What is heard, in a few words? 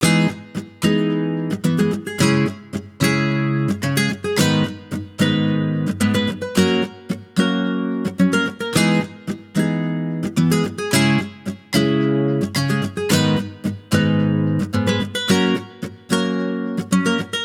Guitar Loop - 110 BPM G# Maj; Future Bass; Instruments; Moonlight; Guitar Loops